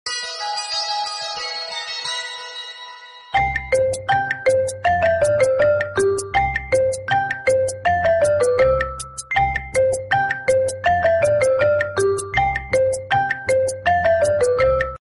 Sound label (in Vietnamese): nhac iphone vui nhon